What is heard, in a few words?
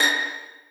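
2nd violins pizzicato a#3; Sonatina Symphonic Orchestra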